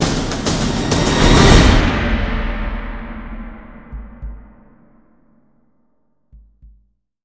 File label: DRUM ROLL SPLASH